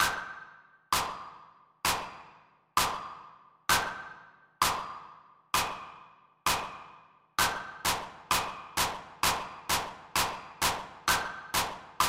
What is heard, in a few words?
jazz improvisation exercises, piano